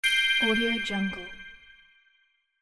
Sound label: bell chimes